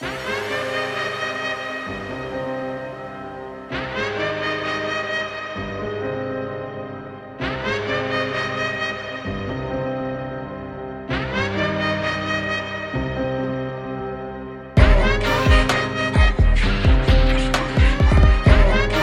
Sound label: Trap beat